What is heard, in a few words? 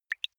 single drops falling